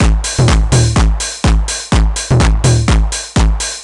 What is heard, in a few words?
drum loop hardcore full 125, Classic Chicago House; Sample Magic; Drum Loops